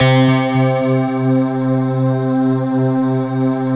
SYN, Roland, SYN DIGITAL; Sound & Vision - Gigapack I CD 2

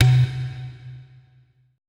E TABLA B

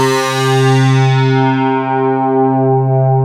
IN SYNC BIG, ROLAND MKS-80; Rubber Chicken Software Sample Bank

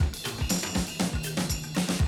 120, Classic Drum Loops

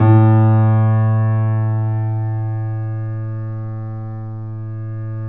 3D Pianos Yamaha C7; E-MU Producer Series Vol. 5

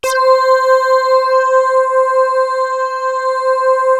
SYN LEAD; MORPHEUS

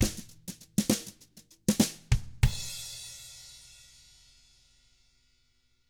FUNKY, Drums

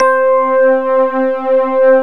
MOOG; Greytsounds VOL-1

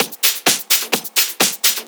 Discohouse Loop 128 BPM

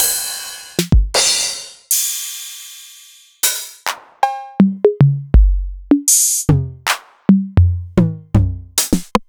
Novation Drumstation

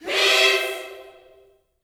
PEACE CHD, Vocal Planet Gospel GOSP CHORD; Spectrasonics

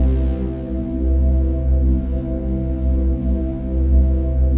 chord pad; Best Service; XXL 800 Pads; Sample CDs

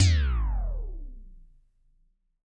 percussion, Roland TD-20

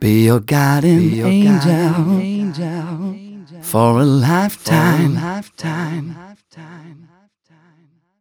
m 129 mel e, Vocals

